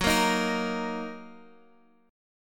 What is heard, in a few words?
F sharp 6th F sharp down Guitar Drop D